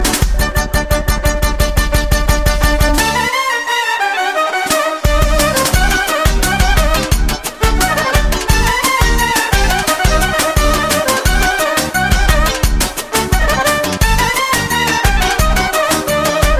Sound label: Instrumental